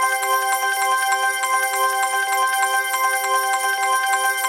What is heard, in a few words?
collect; Oktoberfest